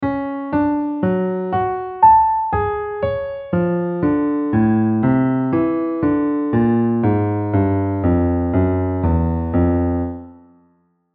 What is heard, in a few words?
piano